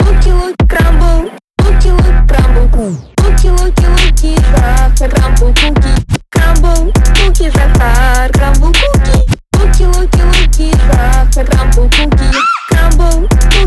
phonk

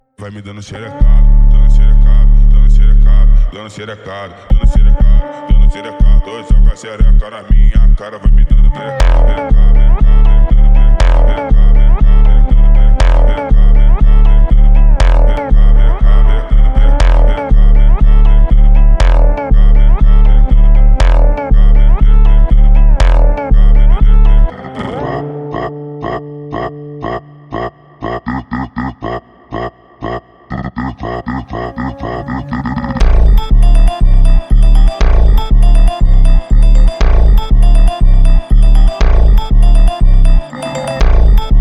brazilian funk

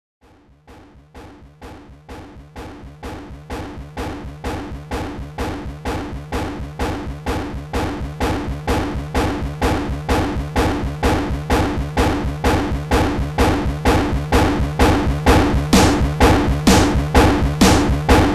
slowed bassboosted

